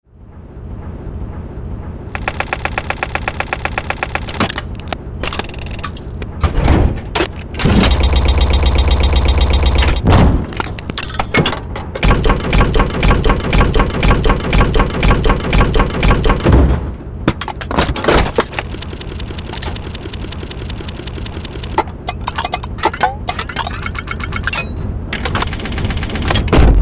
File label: Steampunk Machines Mechanical Sound Effects; Bluezone